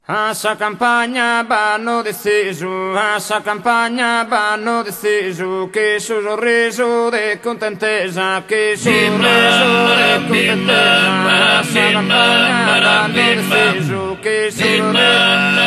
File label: Tenores, Ballu; Ballu Dillu